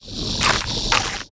VOLCANIC GRUMBLE WAR ATTACK

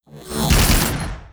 WAR ATTACK, Mob; HOBOTURNPIKE

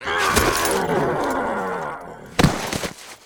WAR DIE; HUMBABA; Mob

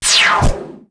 taunt

